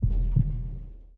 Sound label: Mines Core Pulse